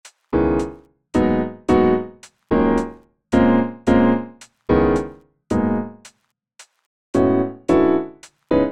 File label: Piano